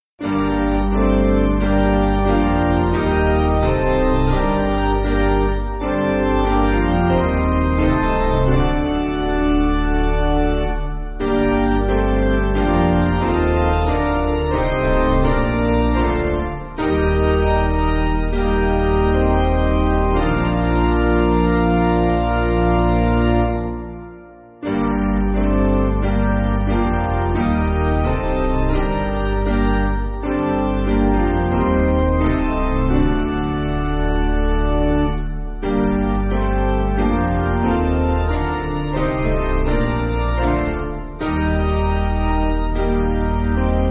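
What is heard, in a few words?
Piano, church backing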